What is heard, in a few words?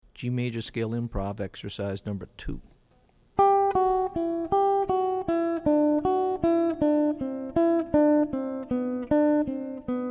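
G Major Scale Improv Exercises; lead skills materials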